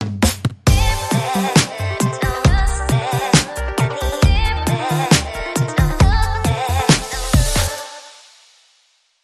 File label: Link Sting